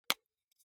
object office marker open lid; smartsoundfx

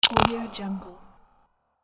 click scifi

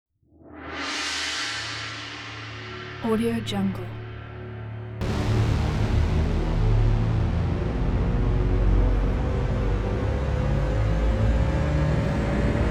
cinematic riser cymbal brass ring

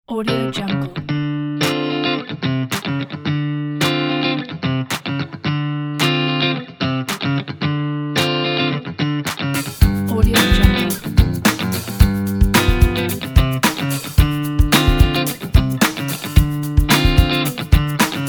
the funky

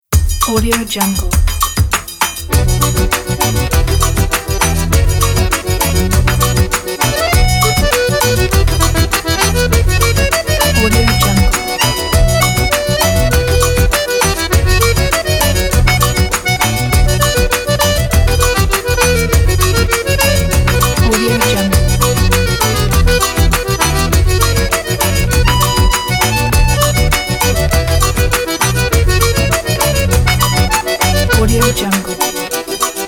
baiao

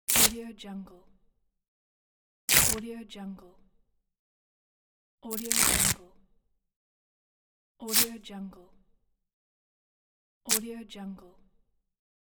tearing and cutting paper pack

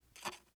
scraping brisk plastic wood

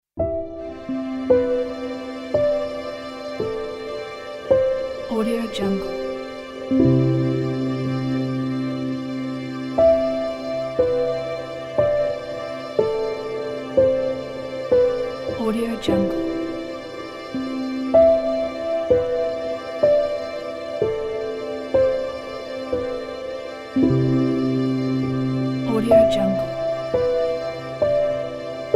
thoughtful landscapes

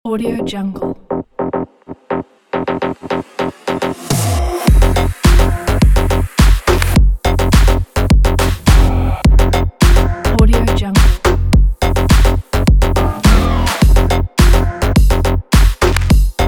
black car drive midtechno

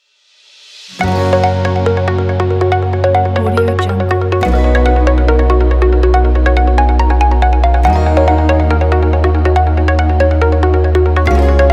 corporate inspiration; velvet-rat

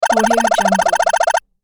cartoon play counter

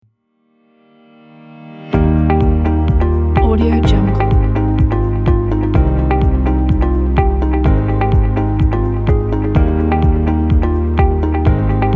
uplifting corporate

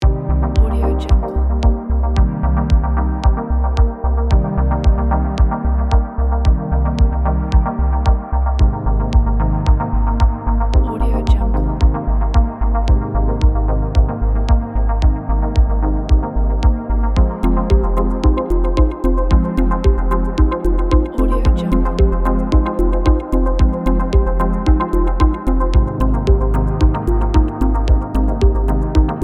ambient techno

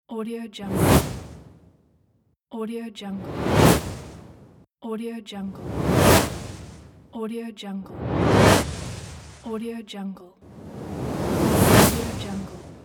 whoosh whoosh

